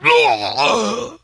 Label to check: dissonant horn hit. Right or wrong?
Wrong